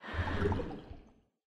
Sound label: underwater breathe air